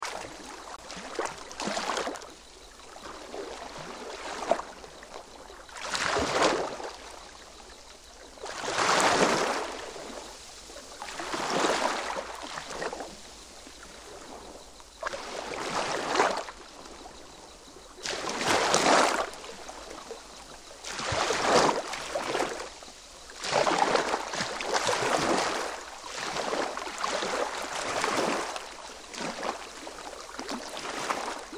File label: wave slow